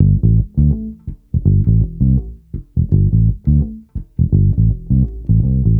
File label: Bass Loop, Ambient Grooves